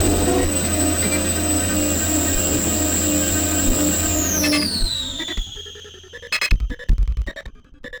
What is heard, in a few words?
Battered Synth; malfunctioning synth samples